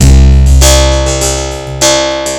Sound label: drloop, drumloops, Vinyl Attack; Masterbits